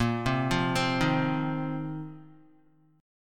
Bb Suspended 2nd Suspended 4th, Guitar; Bbsus2sus4 arpeggiated; 5,3,5,5,3,x; JGuitar

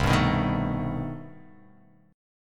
C Minor Major 13th C down

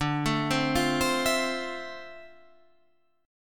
F Major 7th D, Fmaj7/D chord; arpeggiated; JGuitar

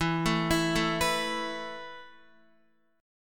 E Suspended 4th E down Guitar; Esus4 arpeggiated

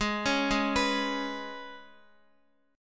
Ab Minor Double Flat 5th, Guitar; arpeggiated; 4F,4A,5C,5G,5C,6E; x,x,8,6,8,7; JGuitar

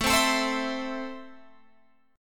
A Minor, Guitar, Open F; strummed

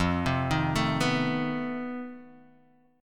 F 7th Sharp 11th F; F7#11 arpeggiated

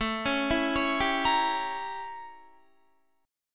A 7th Flat 9th A, Guitar; A7b9 arpeggiated; JGuitar

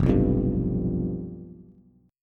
F Suspended 2nd Flat 5th, Bass; strummed; JGuitar

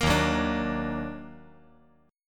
D sharp Minor Major 7th; strummed

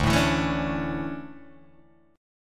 D Major 7th Suspended 2nd, Guitar, Open G

